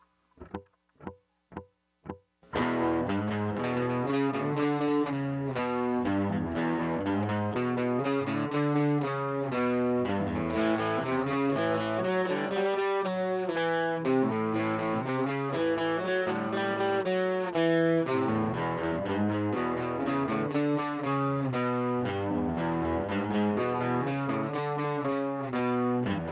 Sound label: riff